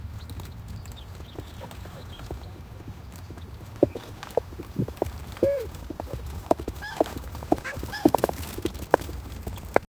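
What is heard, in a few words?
continuous soft pigeon cooing; farmer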